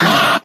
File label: "ah", robot; Voice; ooh ahh